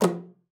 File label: KLPA F3, ALTOSAX; Xsample VOL-2